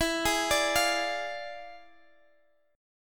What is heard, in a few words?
E 7th Flat 9th Guitar Drop D, chord